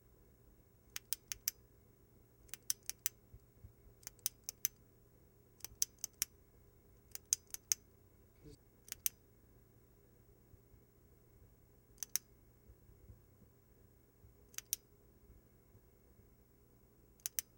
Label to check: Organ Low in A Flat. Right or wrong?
Wrong